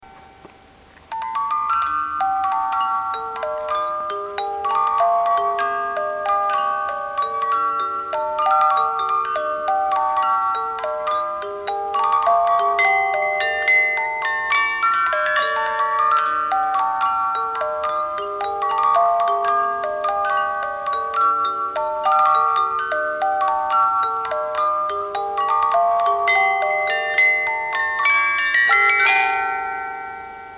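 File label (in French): blumenwalzer 18 lames, boite a musique; Boîte à musique suisse; Brienz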